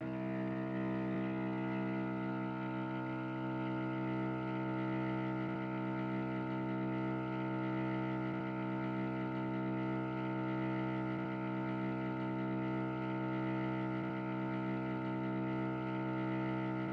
Contrabass 54 bpm; Zarathustra STEM

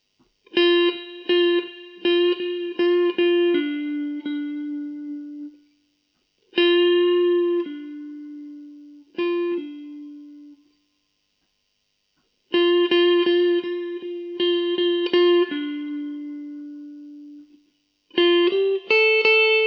blues

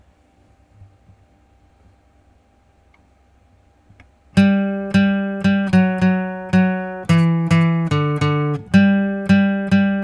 Guitalele

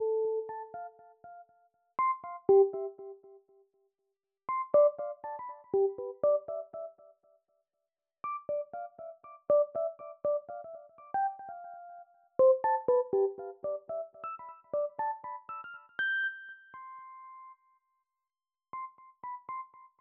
G120 Dark EP

